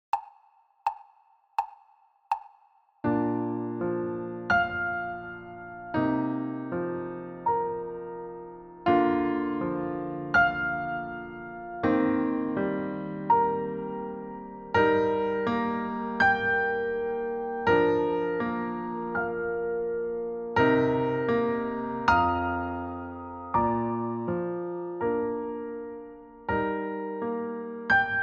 piano

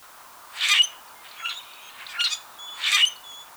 Common Grackle; woods

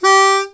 shout

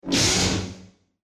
lightsaber collision; ep6-darth-vader; nvidia; geforce; titan-xp-collectors-edition

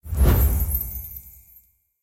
slice

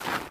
delete

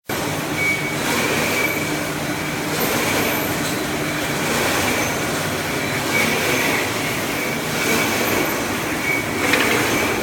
slitting machine

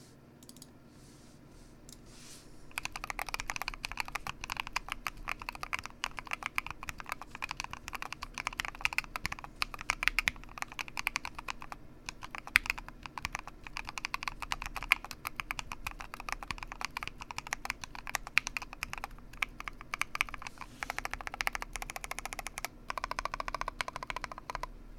base, keyboard; preonic keyboard; tuning

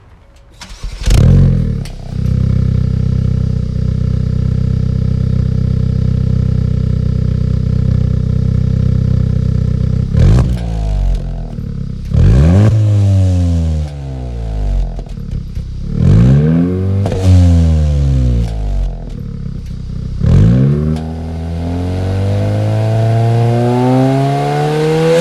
engine start; hyundai

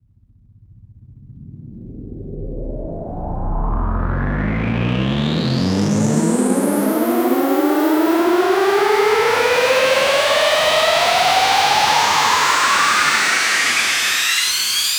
Cinematic Layered Laser

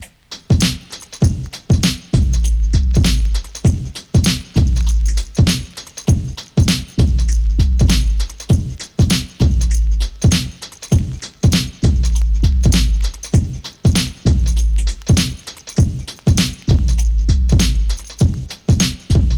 Dust Full Drums Vinyl

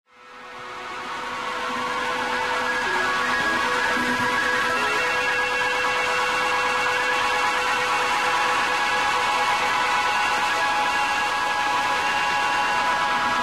Metal Machine Minute

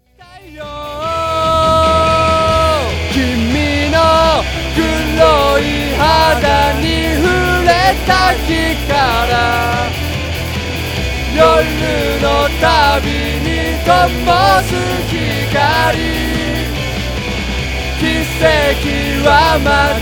Chorus, C414XLS

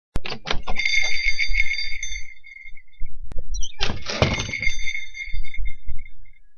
door sound

